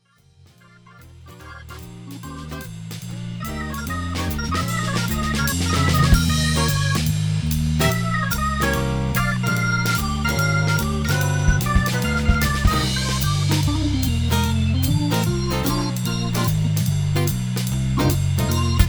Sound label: blues